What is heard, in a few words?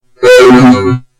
backstab; robo mario